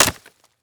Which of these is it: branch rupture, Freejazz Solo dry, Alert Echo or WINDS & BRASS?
branch rupture